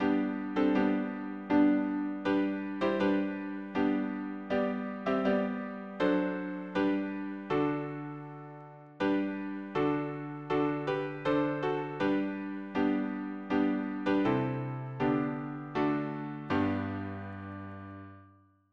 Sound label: harmony piano